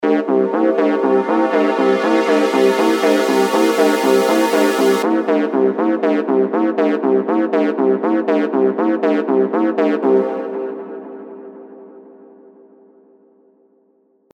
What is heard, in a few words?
synth swirl; hemasynth